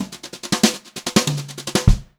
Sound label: brushed drum loops, fill; USB Soundscan vol.39; AKAI; 96 HOTROD